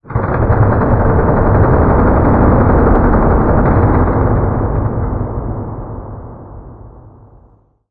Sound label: amb muffled lo mach; video games; ambient